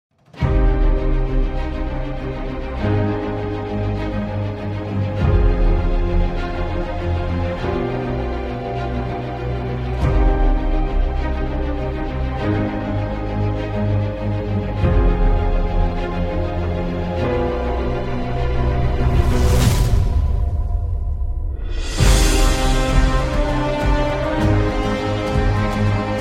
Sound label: A Storm